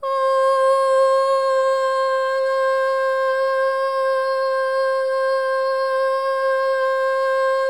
solo boy; Spectrasonics; Symphony of Voices

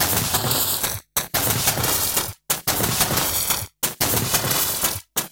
snowgo 090bpm, downbeat dance grooves; Zero-G

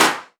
Claps; Vengeance - Essential Clubsounds Vol.5

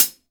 HH 14 INCH

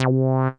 ANALOG, BASS; Best Service; Hyper Dance

